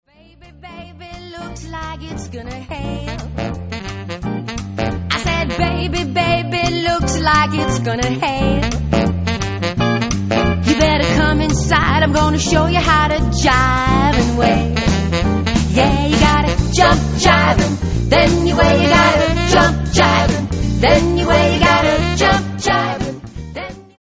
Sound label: Jump Jive